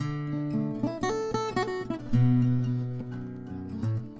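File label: acoustic guitar, guitar; acgtr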